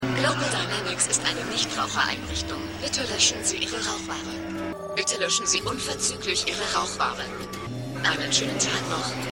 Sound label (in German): Drohne; Stimmproben; Eureka